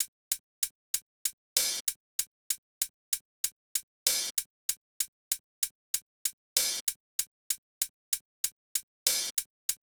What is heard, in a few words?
Hats, Drum Tracks, Amin; Hip Hop; Big Fish Audio; Hip Hop Exotica